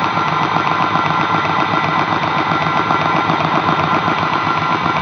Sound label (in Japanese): kikai; 機械音１（高）; マシン 粉砕機 工場 ループにも